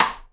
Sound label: SIDE STICK, Live Snares